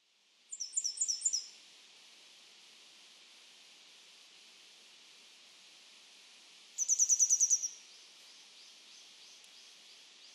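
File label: Grey Wagtail; koe; kisekirei; tanzawa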